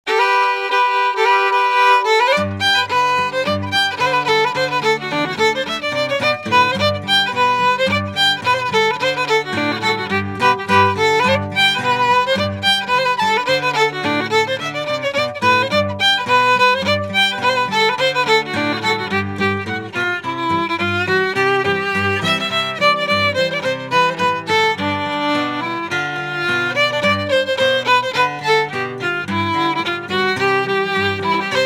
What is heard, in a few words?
Polka